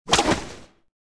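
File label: wild west sounds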